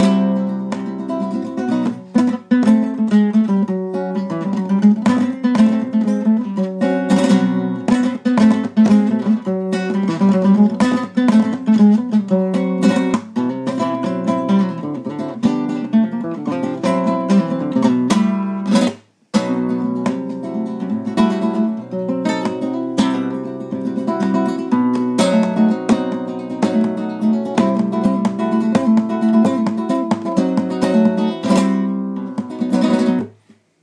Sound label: flamenco